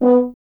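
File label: brass French horn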